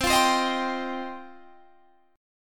F 7th Suspended 2nd, chord

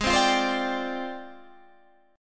F Minor 6th Add 9th G sharp

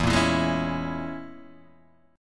A sharp Minor 7th Flat 5th E

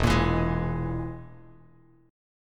E Major Flat 5th E, Bass Standard; strummed; JGuitar

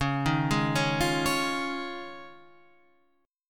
F Minor 7th Sharp 5th, Db

